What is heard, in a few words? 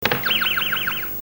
Buzzer; Whats That Noise; Family Fortunes